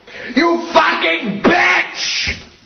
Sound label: YELLING; amnesia: the dark descent; madness archive; madness-ch03-demo; enemy; hater